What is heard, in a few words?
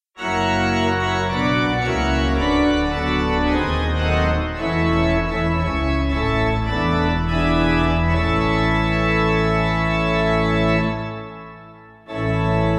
Pipe Organ; Hyfryd Lais 8.7.8.7.D